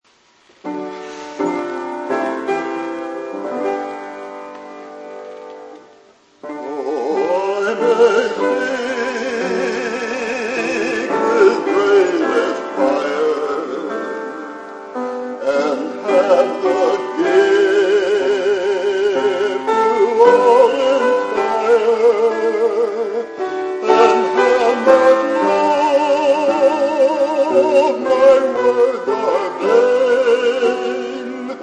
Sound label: solo, choir anthems